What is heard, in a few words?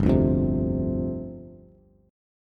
G 7th Suspended 4th G down Bass; G7sus4 strummed